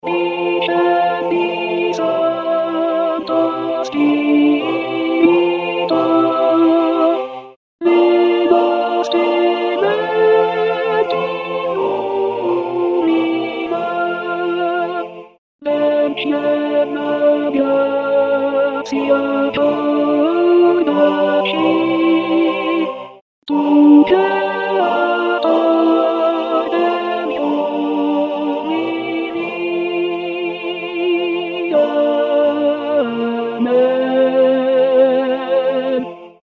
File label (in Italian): contralti; Discendi Santo Spirito